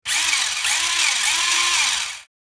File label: RC toy car remote controlled short rev; rc toys sound effects